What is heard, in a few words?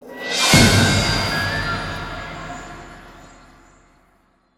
Music Stingers - Battle Stinger